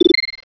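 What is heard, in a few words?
exclamation; Microsoft; Pocket PC 2000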